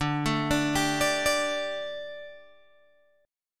D Suspended 4th D, Guitar, Open G

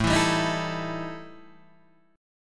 E Minor Major 7th Double Flat 5th

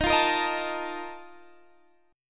Bb Major 7th Suspended 4th, Eb, chord

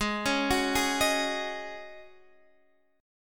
G sharp 7th Suspended 4th G sharp, Guitar Open D

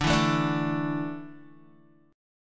D Suspended 2nd D down Guitar; strummed

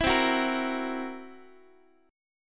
C 7th Flat 5th C down Guitar; C7b5 strummed; x,x,7,10,10,9

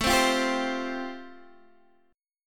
A-Minor 7th Sharp 5th, Guitar Open D, chord

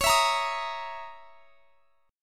Db Minor 6th Add 9th Db down; Dbm6add9 strummed; JGuitar

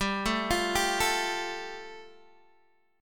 G 7th Suspended 2nd, Guitar DADGAD

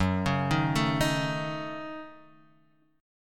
F sharp Minor 6th F sharp Guitar Half Step Down

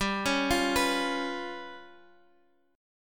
G 7th Flat 5th G; x,x,x,x,7,8,7,8; down

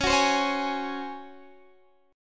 C sharp Major 7th Suspended 4th, Guitar, DADGAD

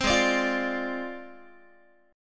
G sharp 6th Flat 5th G sharp Guitar; G#6b5; 15,16,17,15,18,x; strummed

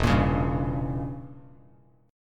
E Diminished 7th E, Bass Standard

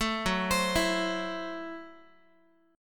F sharp Minor 7th Flat 5th F sharp Guitar; arpeggiated; JGuitar

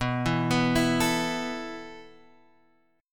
B 7th Suspended 4th B down Guitar Half Step Down; B7sus4

